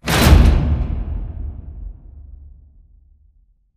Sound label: giant metal door close stop; Titanfall 2